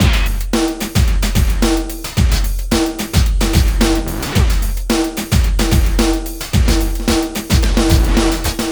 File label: vinyl 110, Dance loops